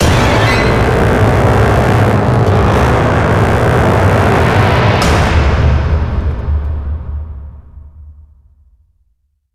String Large

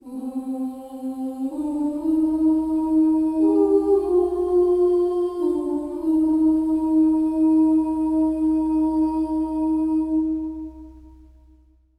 Basic mood; Bosque

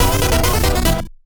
music save; Skellboy

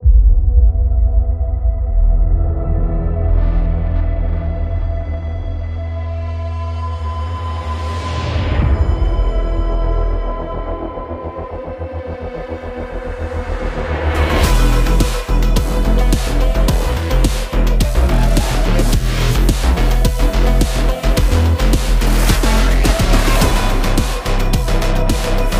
Cinematic Trailer - Synth Version; Spine

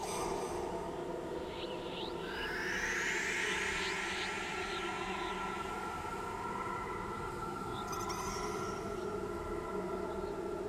Ambience spooky

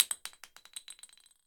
Drop Medcine

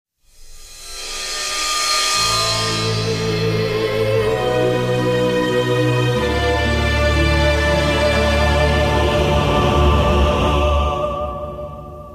Game Lost Music Snippet; mad global thermonuclear warfare

